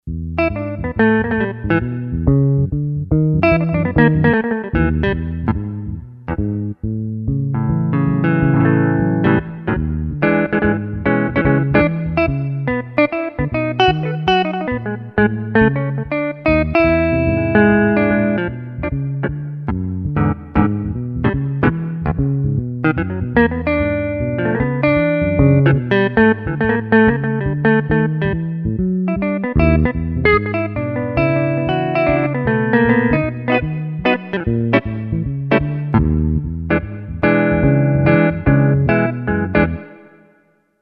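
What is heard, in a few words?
Bass-Jazz Guitar; Roland SC-880; Factory Patches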